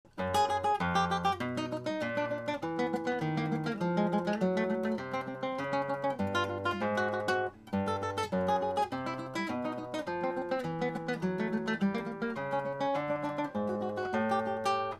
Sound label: cell ascending